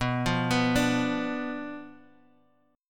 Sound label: B Diminished B down Guitar DADGAD